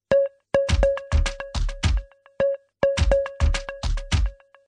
Real Bass Sms Tone

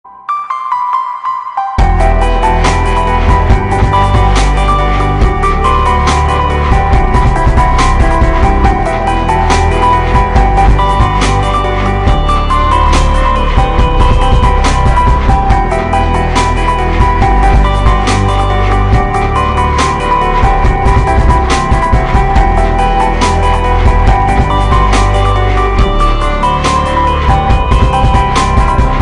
Chilled Dubstep

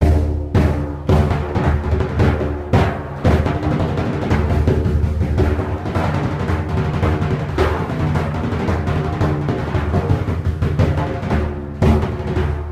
iranian daf

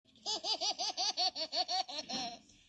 Baby chuckle

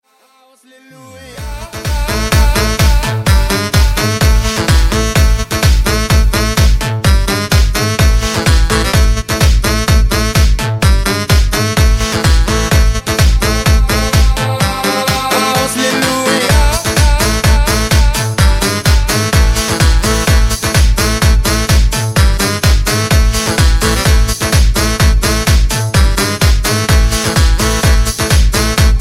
House

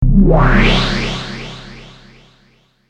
Teleport

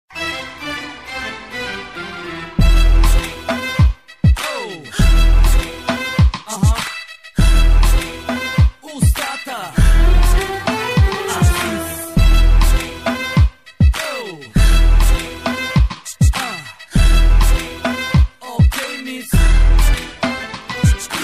Full Bass Arab

